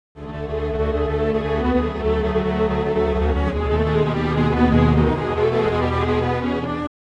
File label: strings, timestretch; i3 dsp quattro x